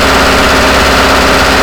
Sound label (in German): axial, diesel; akustik